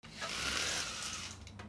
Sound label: HOUSE